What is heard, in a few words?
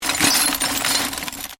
Glass shards movement